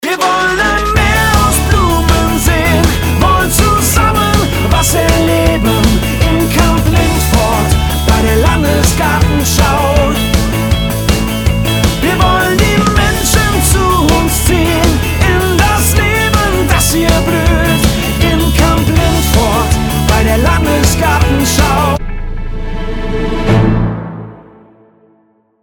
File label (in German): klingelton